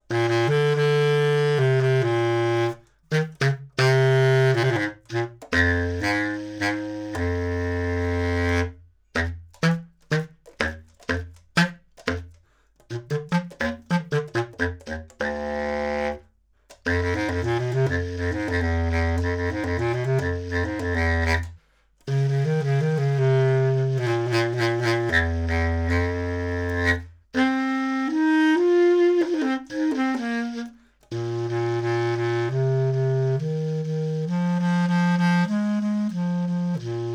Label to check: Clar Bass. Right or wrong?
Right